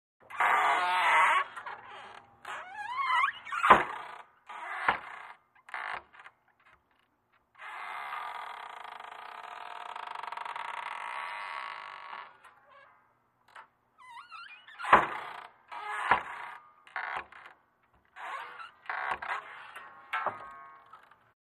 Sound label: Screen Door Creak Woo